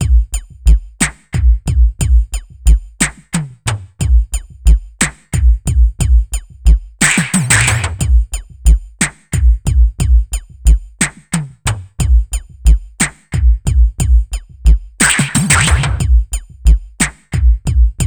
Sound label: hiphop; INIS; Funky Xtreams